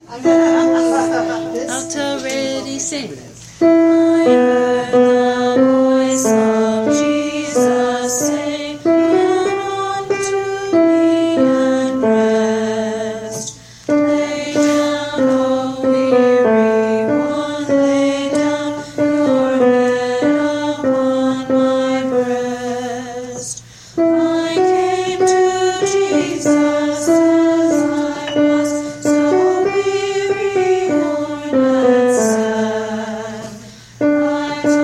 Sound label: alto; I Heard the Voice of Jesus Say; Gather Hymnal